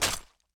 sword

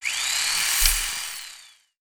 sharp saw

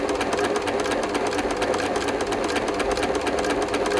sewing machine

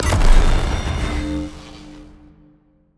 naval gun ready

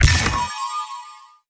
skill nightbox cast

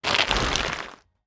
bat

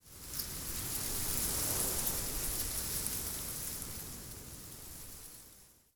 breeze tree

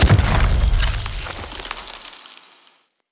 ice prick

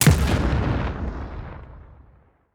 fire boom

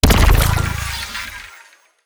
tentacle

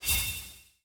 ui direction preview

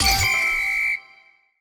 ui win score

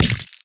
sea sword

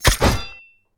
sickle

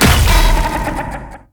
big hammer

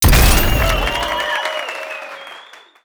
bang; emperor rap

